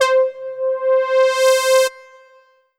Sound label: Yamaha TG500 BR Saw SF C5